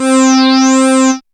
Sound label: Dance Synths, Acid Bubble, c